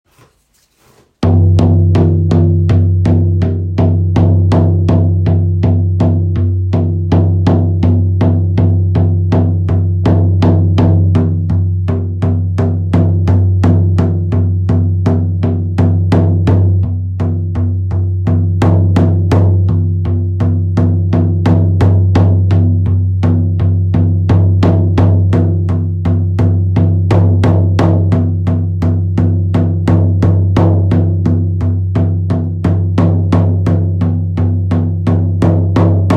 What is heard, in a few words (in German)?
klang einer ritual trommel pferd; Klangbeispiel einer Ritual Trommel